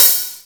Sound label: Hats Electro Open